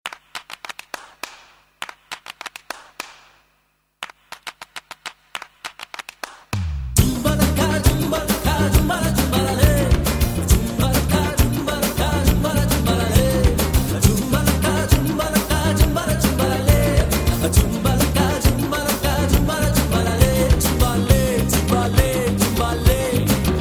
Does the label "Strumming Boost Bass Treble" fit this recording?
No